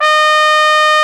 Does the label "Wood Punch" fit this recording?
No